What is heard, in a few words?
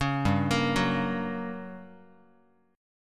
G Minor Major 7th Flat 5th, G, down, Guitar, 4A 4E 5A 5D 5G sharp 6C sharp; arpeggiated; JGuitar